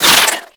Ink Rifle Reverse Start; Warframe; Tenno

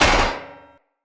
Sphere Board Impact Default, Shields; Weapon Impacts; Sphere Sword And Board; Environment

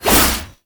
Melee Whoosh; Warframe; Oraxia; Abilities

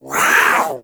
Catbrow Feral Vocal Attack Short; Enemies; Warframe

